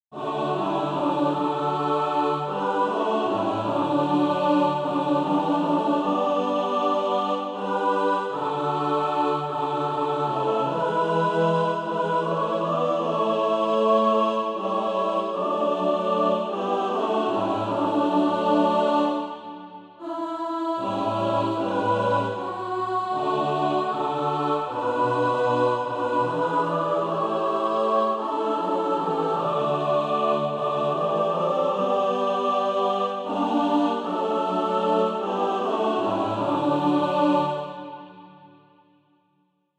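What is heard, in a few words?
mixed, Practise Music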